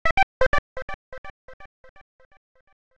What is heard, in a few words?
Error; GNOME